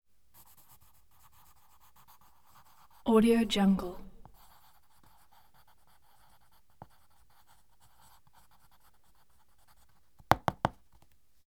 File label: writing soft scraping movements; prosoundfx